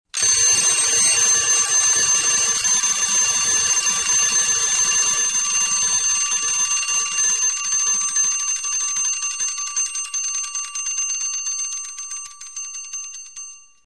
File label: old alarm clock ringtone